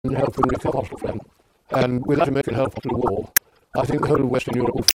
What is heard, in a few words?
Piano Concerto Allegro Non Troppo E Molto Maestoso; Tchaikovsky; Piano Concerto No. 1, Op. 23